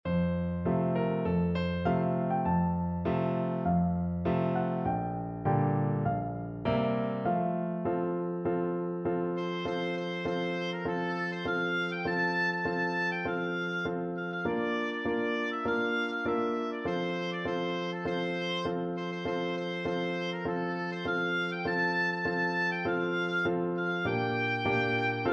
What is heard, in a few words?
battle hymn, oboe